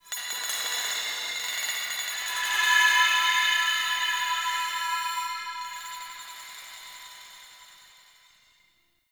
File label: Glassy Texture; Ambience; Warframe; Shadowgrapher